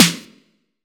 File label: sizzly snare single hit f key, Royality free snare drum sound tuned to the F note.; snare-drum-samples